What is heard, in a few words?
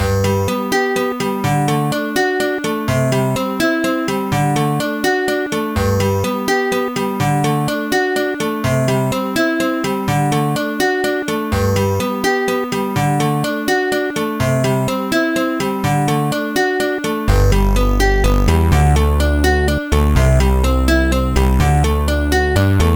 guitar dreams; Trackers; Modland